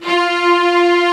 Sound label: HI ST STRING